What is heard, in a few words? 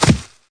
horse jump begin; Mount&Blade Warband